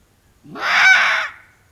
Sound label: cerdocyon thous